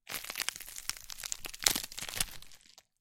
zvuki listev salata

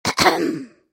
zvuki pershenie v gorle kkhm

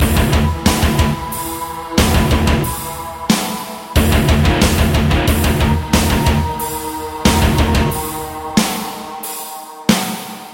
zatsiklennye melodii dlia gitary loop

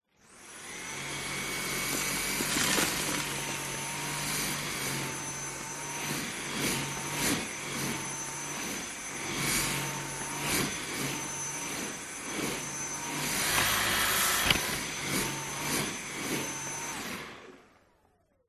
zvuki ruchnogo pylesosa

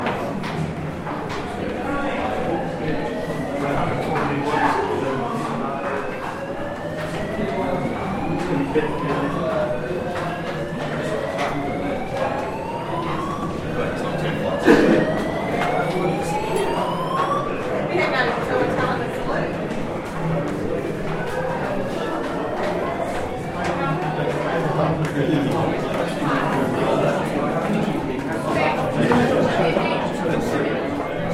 zvuki evakuatsii

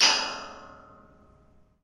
zvuki metallicheskogo trosa (kabelia)

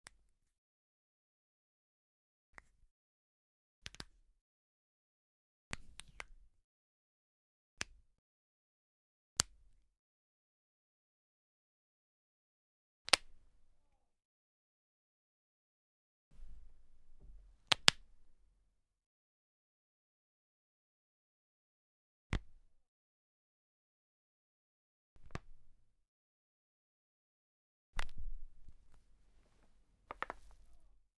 zvuki khrusta paltsev

